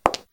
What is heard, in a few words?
step; shweets sim